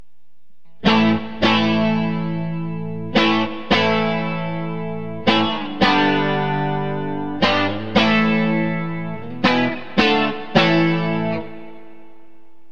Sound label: bridge clean; phatcat; Burny